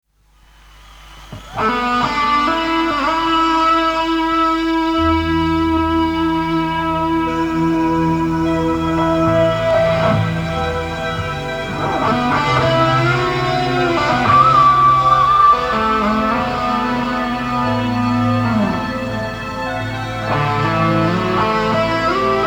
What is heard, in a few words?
drum solo live version